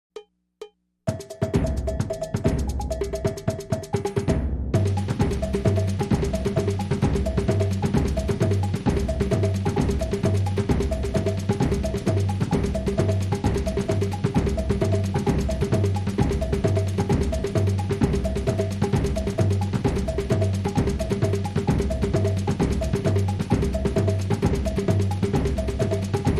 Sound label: Carnival Loop, Drum Kit Only